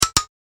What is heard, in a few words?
Double Click; multimedia